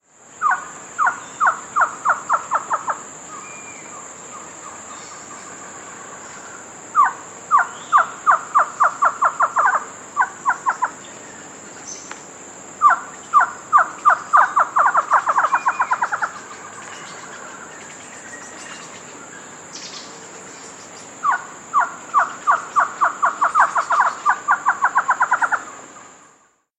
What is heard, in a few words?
Castlenau's Antshrike; S. America